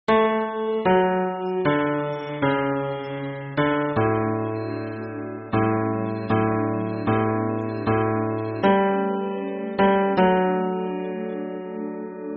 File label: hymn bass